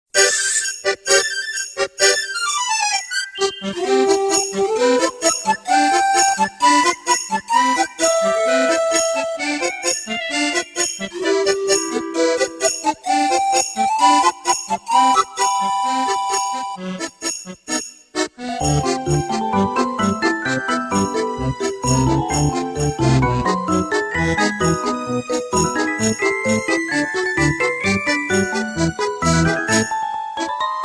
Snow Theme

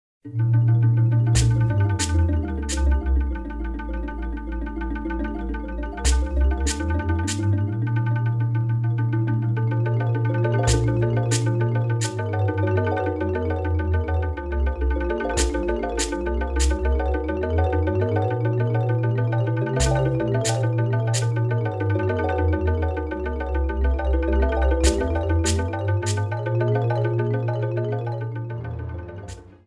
Music; MK64; Banshee Boardwalk

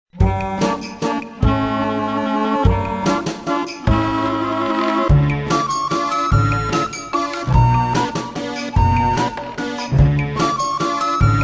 Horror Land

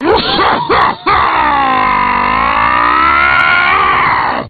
crying; Wizpig

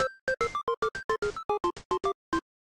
Clear Level

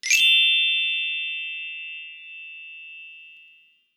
Sample Bah Bell

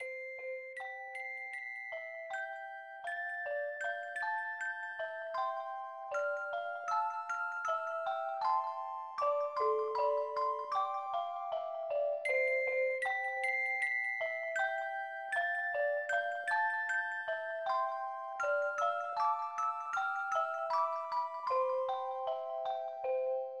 Game Over

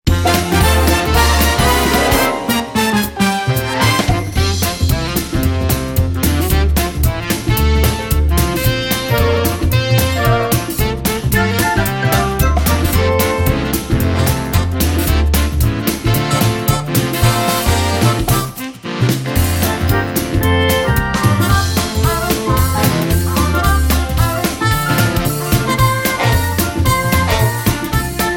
Super Bell Hill

